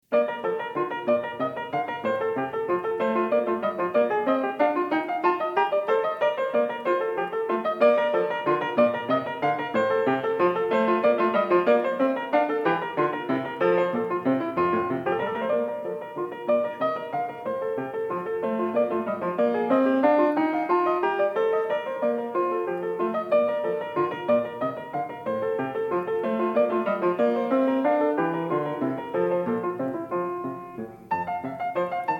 Partita 1 in Bb, Menuet I-II, PIANISTS; BACH; PARTITAS; BWV825

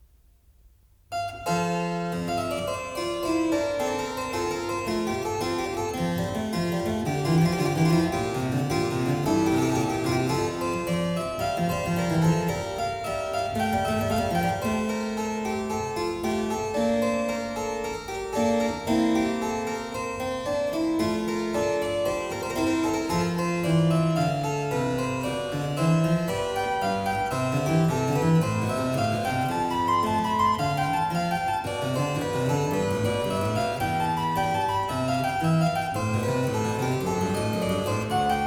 F major, Allemande; BACH; CLAVIER WORKS; English Suite No.4 in F major; BWV 809

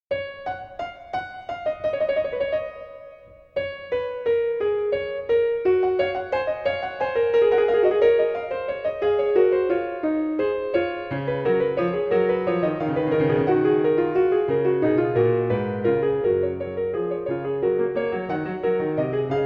Fugue in F-sharp Major; BWV 858; BACH; WELL-TEMPERED CLAVIER

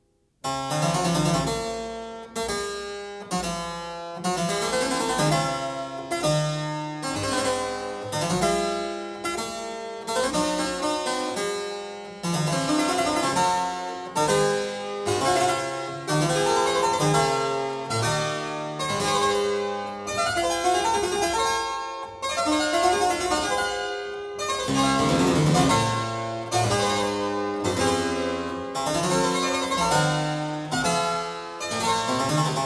Fugue; Fugue 5; BWV 850; BACH; WELL-TEMPERED CLAVIER; WTC 1

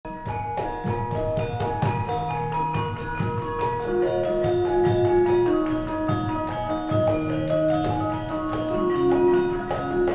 Javanese Gamelan; Srepegan; Indonesian

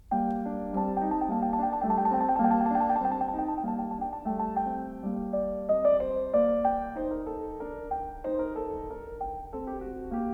Prelude, Fugue in G minor; BACH; WELL-TEMPERED CLAVIER; book I; BWV 861

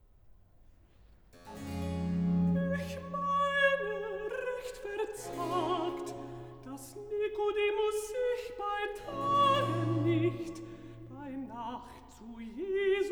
Recitativo Alt, BACH for ALTO; Ich meine recht verzagt

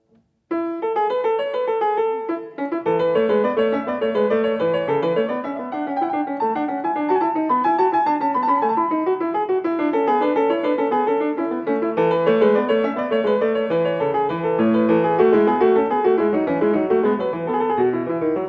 Bourrée, PIANISTS; English Suite No. 1 in A major; BWV 806; BACH; ENGLISH SUITES

